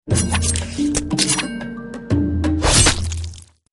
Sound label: Master Of The Sword Alert